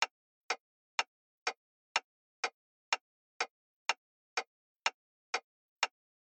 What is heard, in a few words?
sys Metronome